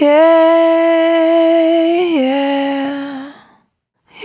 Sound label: Single Voice Melodic Loops; Pocket Diva World Class Vocal Samples